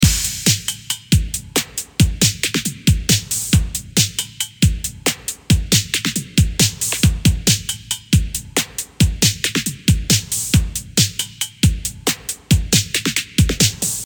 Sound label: Beats and Effects